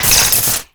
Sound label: land wire spark, terrains; Tony Hawk's Project 8; XBOX